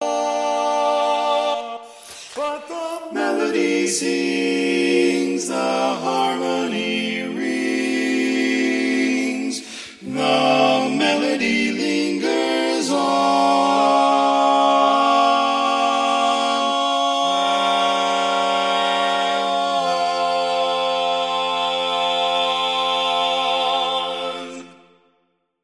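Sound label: Baritone, Barbershop Tags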